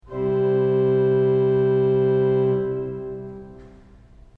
I principal 8 C

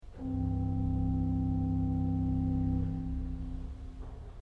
burdon 16 C

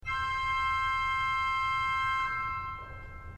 I superoctav2 C; srednja vas

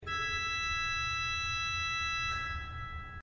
II terz 2 C; srednja vas

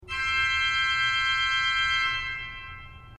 I mixtur C; srednja vas

